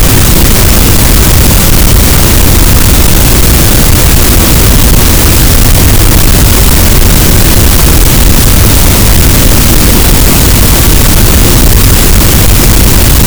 cachalot, Physeter Macrocephalus, gaussian noise

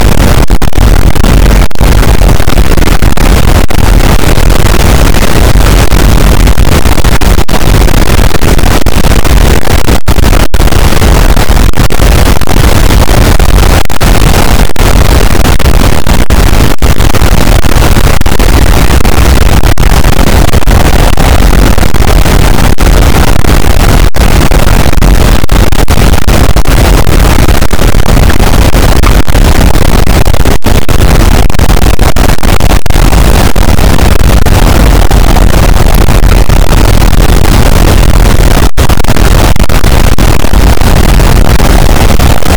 cachalot negatif, boat, Physeter Macrocephalus

